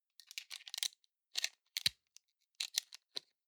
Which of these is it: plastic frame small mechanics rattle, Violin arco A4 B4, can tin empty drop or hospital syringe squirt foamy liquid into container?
plastic frame small mechanics rattle